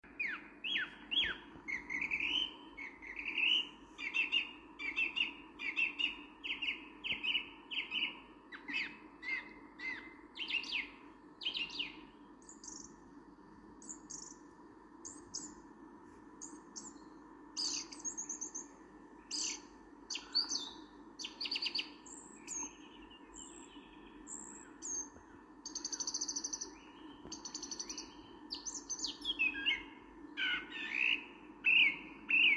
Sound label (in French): Grive musicienne